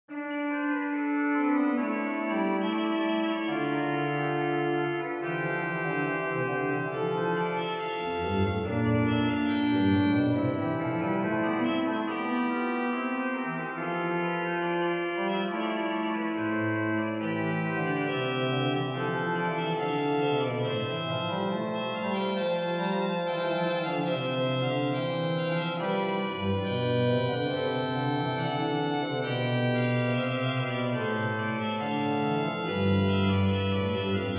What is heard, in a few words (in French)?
Orgue Solis ortus cardine en haulte contre, Souvigny; Couperin Louis